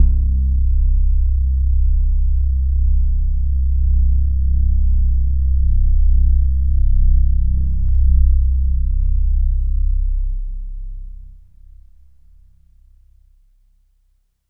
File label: Overlay Drone; Act1 The View